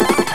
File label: Explosion; Revolution X